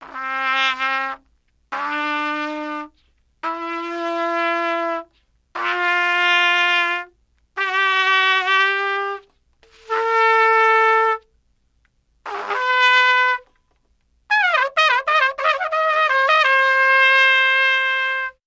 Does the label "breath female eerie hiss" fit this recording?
No